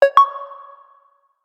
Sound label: Rising, notifications; Realme 8